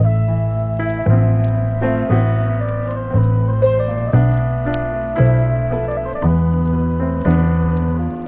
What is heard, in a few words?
keys